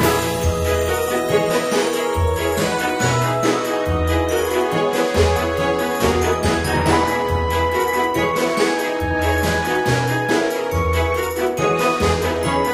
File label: Ship; ruu goblin quest